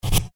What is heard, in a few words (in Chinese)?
写作业音效